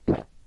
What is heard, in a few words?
potion pick